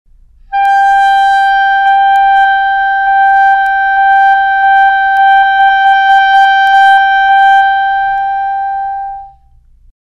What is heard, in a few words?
Clarinet